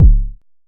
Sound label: analog deep, Kickbank; mpk mini presets; Ableton Foundation FreePacks